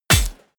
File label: bullet large impact metal; krieg-waffen; geschoss